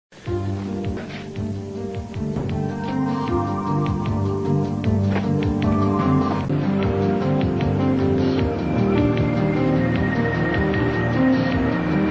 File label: dramatic theme